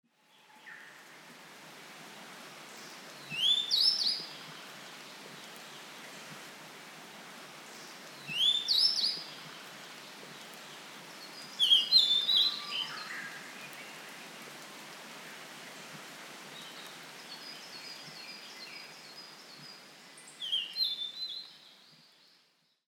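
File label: Blue-and-white Flycatcher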